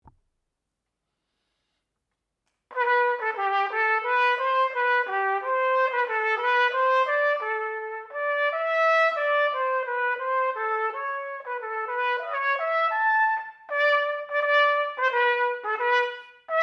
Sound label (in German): Trompete